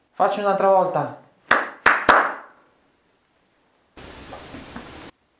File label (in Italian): Tre colpi; dopo richiesta di imitare i tre battiti di mani; Bogoni